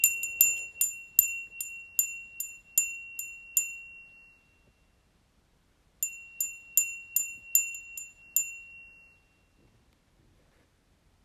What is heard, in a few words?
windbell